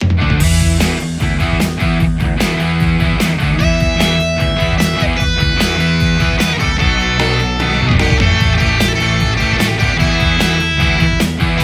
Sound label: kuassa Vermilion modern